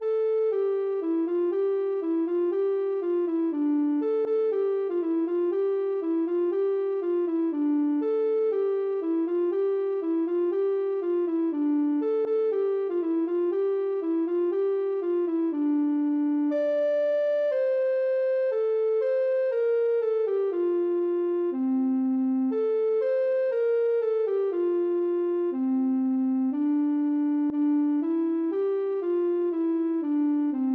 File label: ocarina